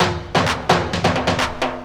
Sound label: drum 130 perc, drumloops; Techno Trance Essentials